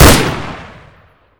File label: fire